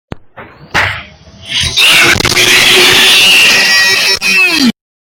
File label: parents slap kid then kid screams